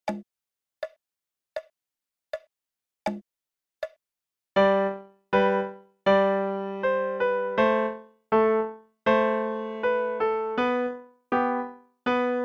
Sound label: Deben Gavotte Slo